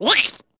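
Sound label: Kid Damage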